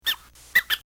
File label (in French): souris